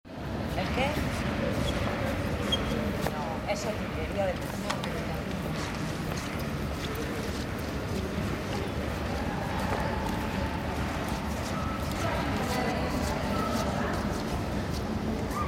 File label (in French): sol urbain chuintements